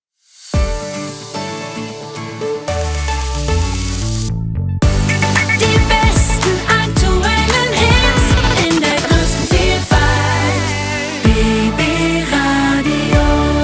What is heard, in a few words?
Radio Jingles; BB RADIO